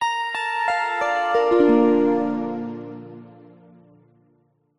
musical ident signature soft calm warm sincere bright